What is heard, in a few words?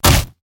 body impact ground